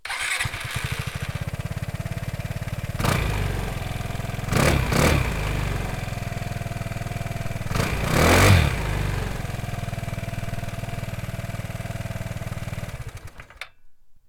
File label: Vespa 300 GTS